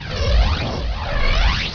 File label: Bow Ready; Heretic II Demo